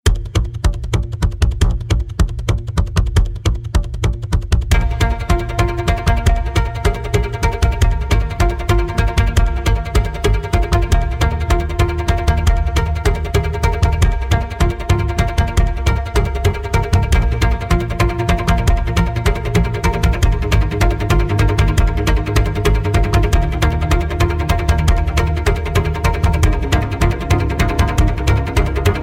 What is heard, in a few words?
drums